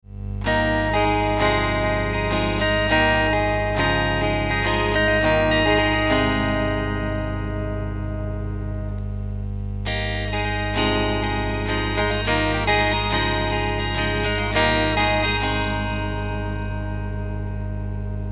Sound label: nighthawk sounds, gitaren